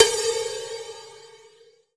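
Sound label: FX COWBELL; AKAI S-Series CD-ROM Sound Library VOL-5; FX PERC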